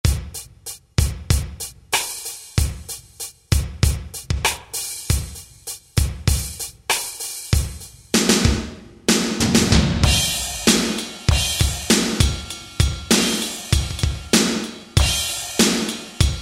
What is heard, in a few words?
ROCK DRUMS; Roland SN-U110-10